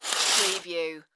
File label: curtain slide